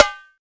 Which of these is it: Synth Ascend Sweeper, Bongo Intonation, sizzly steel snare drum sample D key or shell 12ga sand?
sizzly steel snare drum sample D key